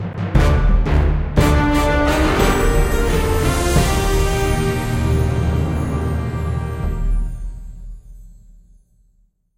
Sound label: Success Sting; Jurassic Park The Game